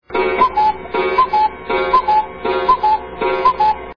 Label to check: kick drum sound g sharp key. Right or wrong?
Wrong